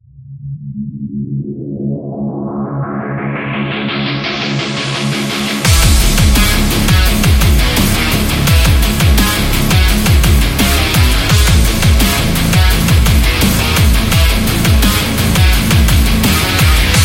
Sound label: Action